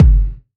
verby kickdrum sample g key, Royality free kickdrum sound tuned to the G note